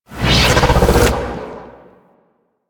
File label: Chaos Cast; Warframe; Nyx; Nyx Updated Sounds